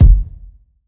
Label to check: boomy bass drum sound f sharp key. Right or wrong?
Right